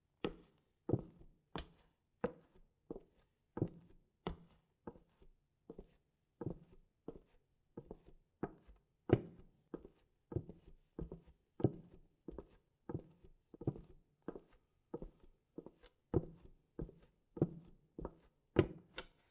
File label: Normal Wood Shoes Walking, footsteps; Todd Blankenship